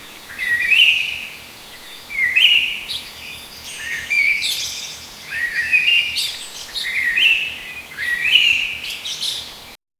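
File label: birds chirping in a forest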